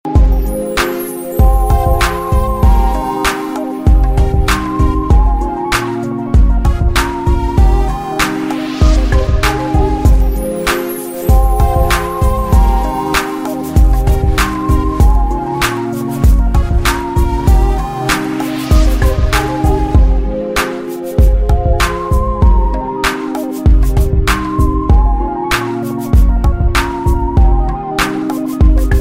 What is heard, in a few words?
BGM Ringtone